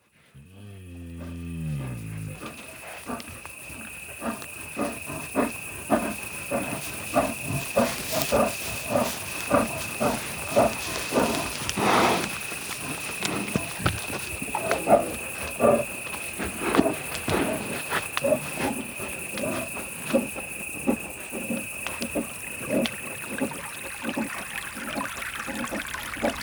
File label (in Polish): Wizytowki Dzwiekowe TATRZANSKI PARK NARODOWY